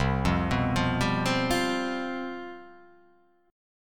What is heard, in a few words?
C Major 7th Suspended 4th